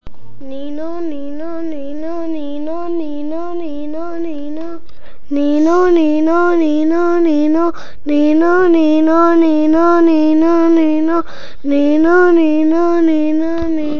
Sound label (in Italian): AMBULANZA, è un suono che va su e giu; MEMO "Multicentro Educativo Modena S. Neri"